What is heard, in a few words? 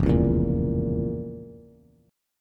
F Major 7th Suspended 4th Sharp 5th F, Bass; FM7sus4#5 strummed; JGuitar